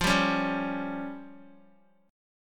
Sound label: F Suspended 2nd Sharp 5th F down Guitar; Fsus2#5 strummed; JGuitar